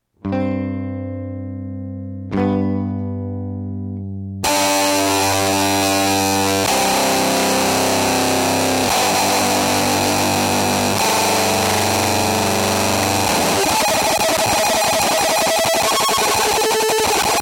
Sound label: noise